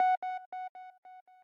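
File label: square lead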